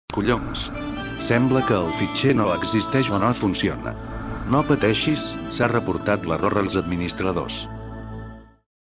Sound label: dubwize